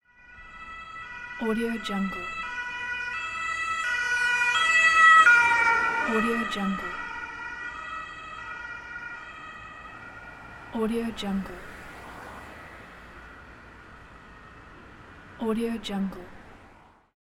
car passes by with siren

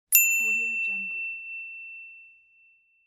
bell ding

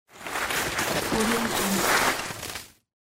snow rustle

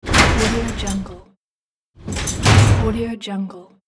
metal prison door closed

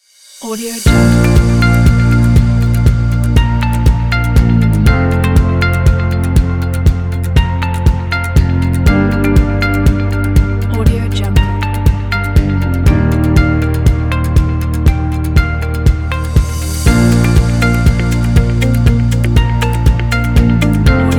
upbeat corporate music

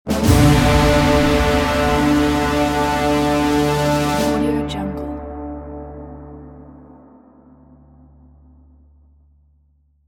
horn sound

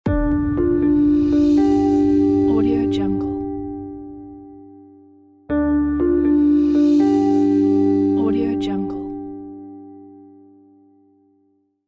logo with guitar

